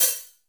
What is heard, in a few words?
HI-TECH KITS; 90 sSampleCDs; Crypton - DrumBase5000; PERFORMANC